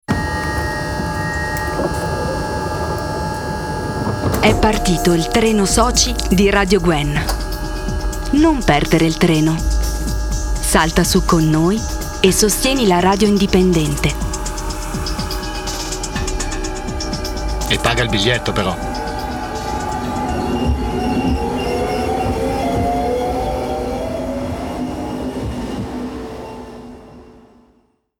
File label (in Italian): Jingle; Treno